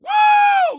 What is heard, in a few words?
woo